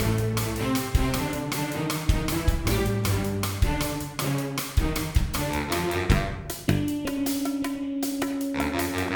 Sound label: Bee Hive Platforming MIXED; Ben 10; switch gamerip